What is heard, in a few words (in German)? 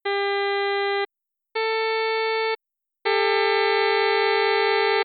Kleiner Ganzton 10zu9